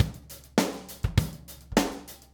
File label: 102 Rim Grooves, Drums; Pro Tools Essential Loops; Pop Rock; Moderate Tempo